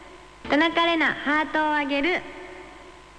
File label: jingle